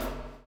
CHAIN; Spectrasonics - Backbeat

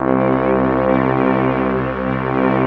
BRASS STR C2, Analog Fantasies, KORG; USB Soundscan vol.49; AKAI

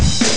hh drum; ST-XX Amiga Soundtracker Sample Packs

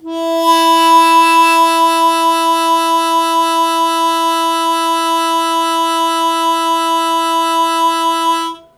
Long Sustain Fast Wah Wah E1, Harmonica; Zero G - Harmonica

